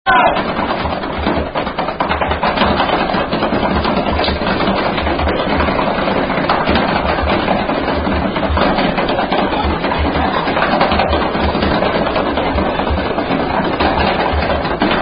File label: Signs Indian Drinking Game; Thumper